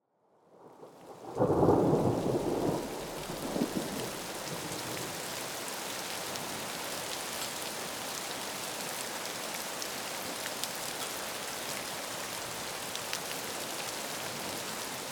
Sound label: thunderstorm